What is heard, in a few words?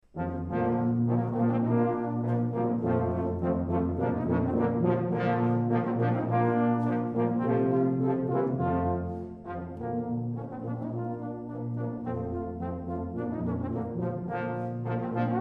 trombone